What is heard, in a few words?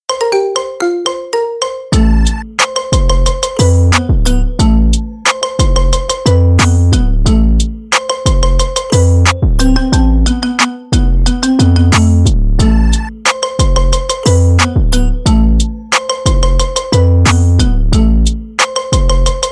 marimba remix